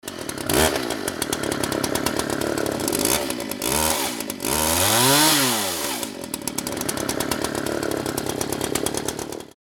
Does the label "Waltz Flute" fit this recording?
No